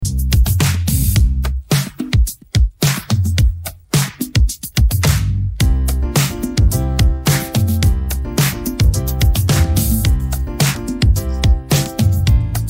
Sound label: Karaoke Song